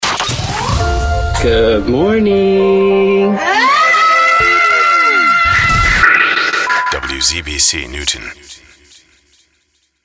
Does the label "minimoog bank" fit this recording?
No